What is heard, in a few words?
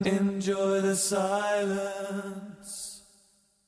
Vocal